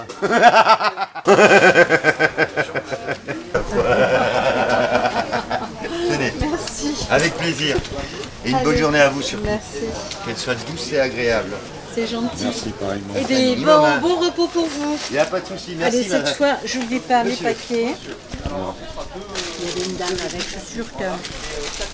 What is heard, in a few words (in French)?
rire; maraicher; pain; Aveyron; Terrasson; pour un bon pain; marche de terrasson; vallee de la vezere